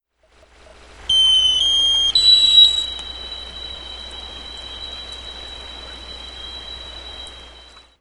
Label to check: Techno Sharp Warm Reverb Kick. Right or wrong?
Wrong